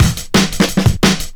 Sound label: 175, Breaks; EZRollers